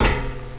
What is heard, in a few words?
grate